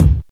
sharp kick drum sound e key, kick sound tuned to the E note